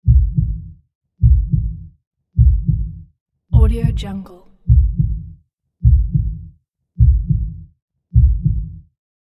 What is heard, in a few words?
heartbeat